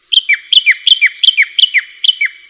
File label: birds